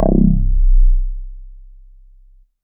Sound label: C1, Synth Line; 90 s Sample CDs; NiceBeats; SynthLine VOL-18